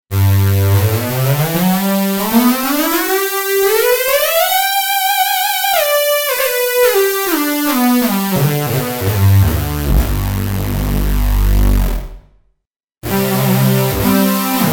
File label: moss lead, synth